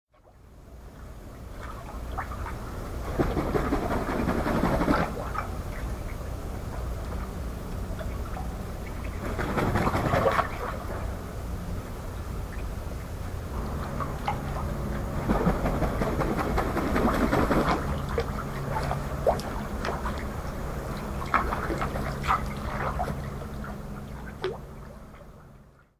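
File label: kurotsura, Black-faced Spoonbill; クロツラヘラサギ; 羽音; 沖縄県豊見城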